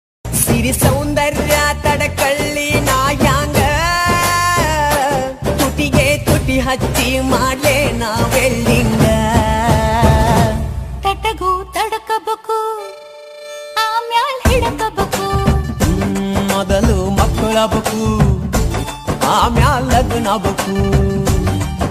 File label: stylish bgm